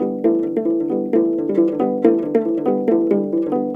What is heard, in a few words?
HRP AF HRP, Afro Harp Phr